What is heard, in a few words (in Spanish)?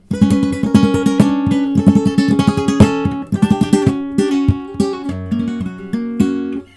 falseta